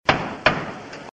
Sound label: judge gavel two times